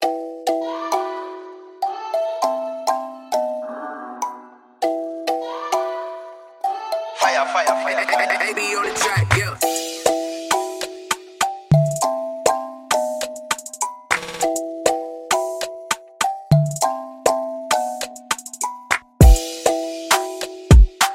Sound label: Instrumental